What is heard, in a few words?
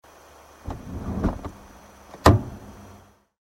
W123 Diesel Sounds Diesel Benz Moving the seat